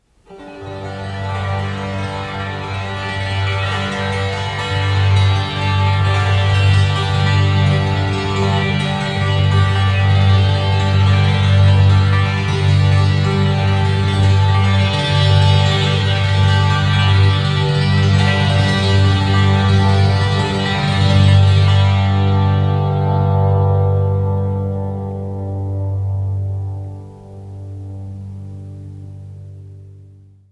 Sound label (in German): Koerpermonochord